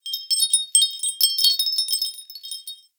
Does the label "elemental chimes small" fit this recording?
Yes